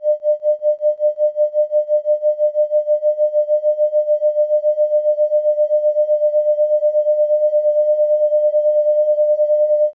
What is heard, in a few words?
tone